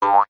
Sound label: cartoon bounce jump spring single jaw harp